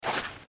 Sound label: step; spongebob; super-easy-fun-adventure